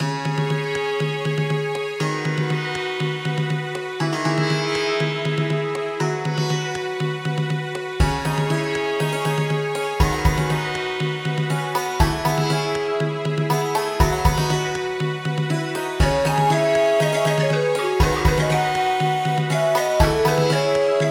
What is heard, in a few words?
desert wind; ハロウィン曲のつもりのなんちゃってオーケストラ曲。